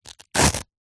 hospital blood pressure machine arm band velcro rip